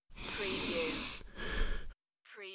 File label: Breathing In Breathing Out; beanstalk audio